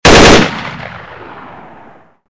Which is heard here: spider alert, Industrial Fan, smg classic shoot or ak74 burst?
ak74 burst